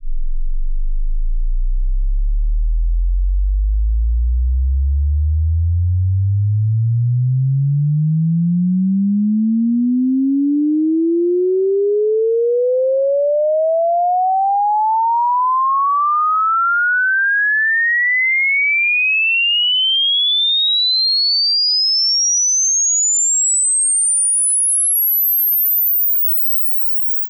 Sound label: sweep 20Hz 20480Hz